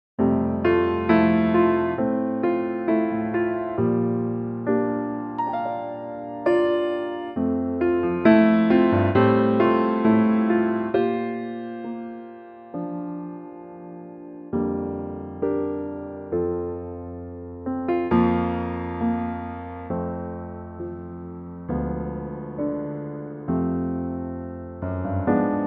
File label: F piano